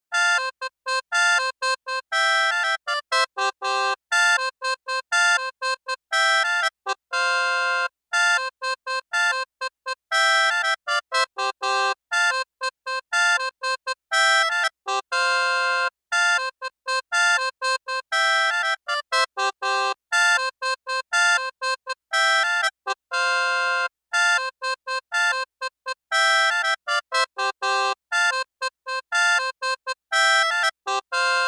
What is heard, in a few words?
Gramophone; Clock Tower 20th Anniversary Sound Collection